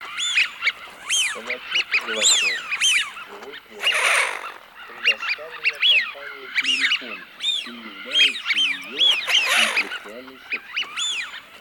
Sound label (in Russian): Свиязь Fischione